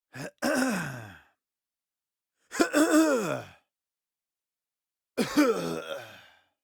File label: male clearing throat